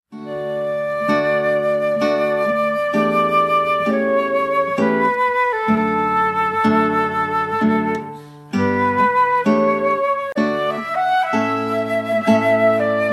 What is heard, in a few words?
Gtr Flt Largo